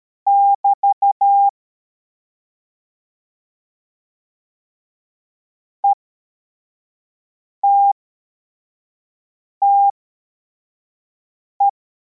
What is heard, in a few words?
cw, morse